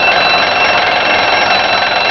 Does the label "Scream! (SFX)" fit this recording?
No